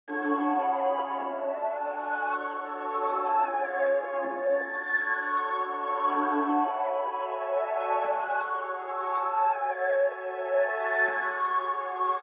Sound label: 158 BPM F# Min High Reverse; Loop Stems; Cymatics; 2022 Melody Collection; Time Travel